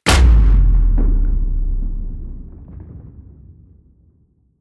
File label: hit wood, hits impacts; whooshes rises